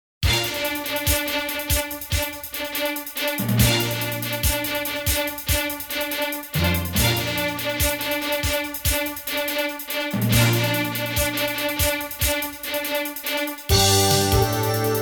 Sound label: Evening News; Soundzabound Volume 1 Disc 3